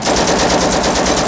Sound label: amb; incudemo